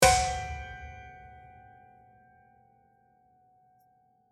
Haunted piano string hit horror

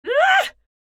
Elderly woman grunt and yell; scream

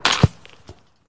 Soccer goal net hit

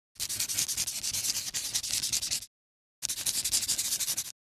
Shoji paper handwriting pen scribble

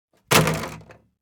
Small garbage bag objects throwing away dumpster; household

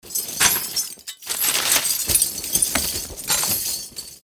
Medieval armor and leather uniform handling

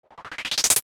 Line graph rising animation infographics

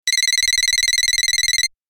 Old cellphone ringing single

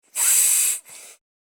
Inflatable toy inflation single blow

